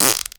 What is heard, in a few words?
fart